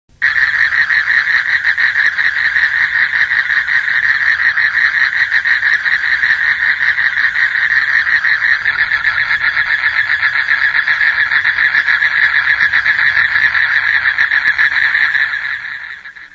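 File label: Duttaphrynus melanostictus; Duttaphrynus melanosticus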